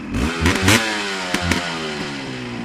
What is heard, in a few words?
accel